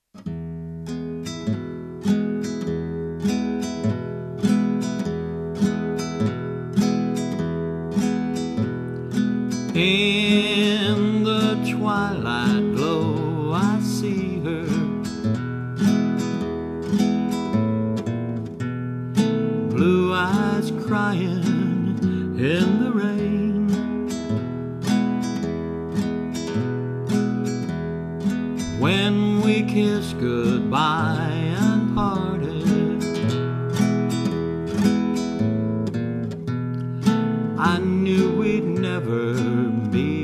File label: guitar